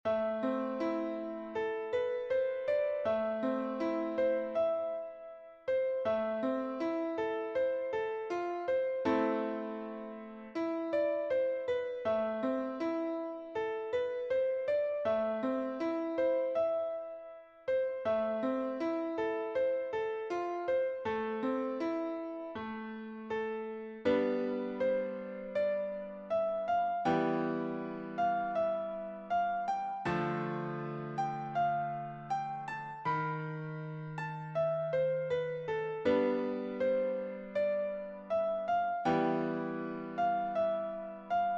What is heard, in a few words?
piano